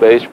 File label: LoFi Vocals Oneshots; Vengeance Samples